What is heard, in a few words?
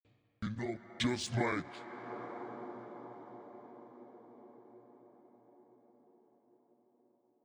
fx Halo